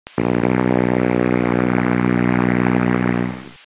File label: foghorn